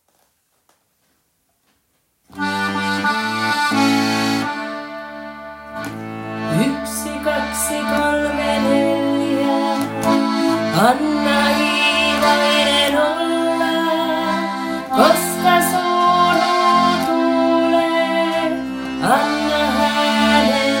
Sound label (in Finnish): polska